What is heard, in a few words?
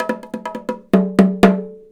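timbales, Indian Traditions; USB Soundscan vol.45; AKAI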